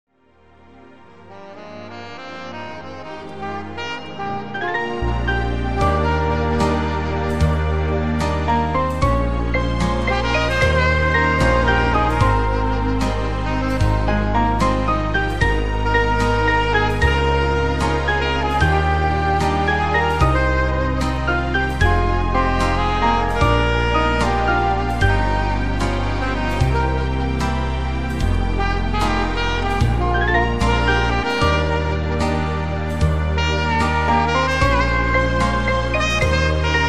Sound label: sax; jazz standards